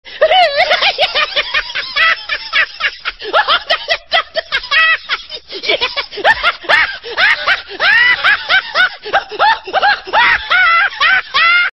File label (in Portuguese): rindo muito